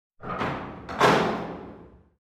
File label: metal door closes